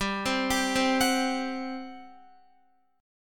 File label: G Major 7th Suspended 4th G down Guitar; GM7sus4 arpeggiated; 4E,4A,5D,5G,5C,6F